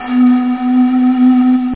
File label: FLUTE; INSTRUM, WINDS; Easy Personal Web Page